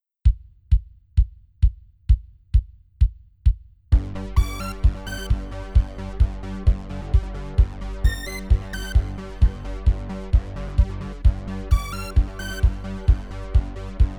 fitness music 432 Hz, dynamiczną muzykę instrumentalną; Tranceblaster; 131 BPM